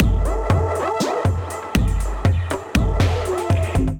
Kit, drums; Bark Park Kit; Ableton; Skitter and Step

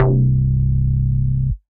Tango Plush, Bass

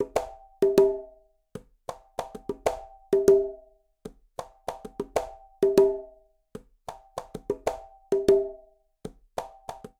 Songo 1 B Quinto 96bpm; Latin Percussion; Ableton; Factory Packs

